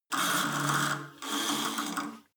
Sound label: cable machine old pull release fast